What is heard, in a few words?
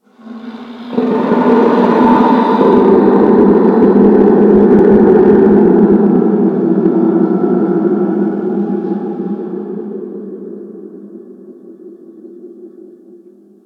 Ice Creature Distant Cry; Ambience; Warframe; Corpus Ice Planet